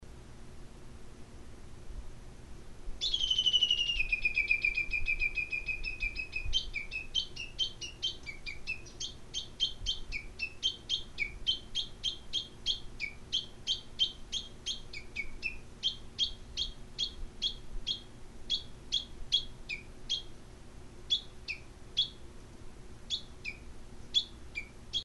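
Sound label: uguisu, bush warbler; uguisuchin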